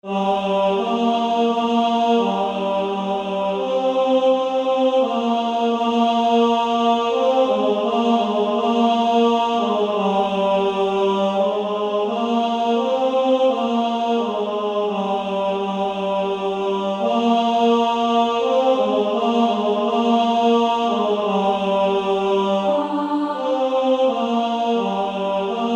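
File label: Female Choir alto 2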